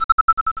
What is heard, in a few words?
PLATPULS